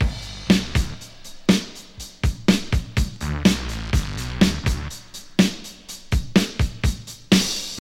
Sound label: 123 bpm high quality drum groove g key, Free drum groove - kick tuned to the G note; drum loop